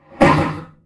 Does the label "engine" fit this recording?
No